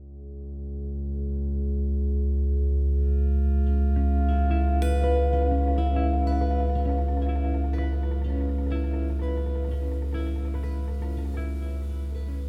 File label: Roots Rock